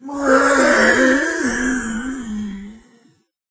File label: Future Groan